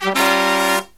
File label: Killer Brass Riffs, 108 soul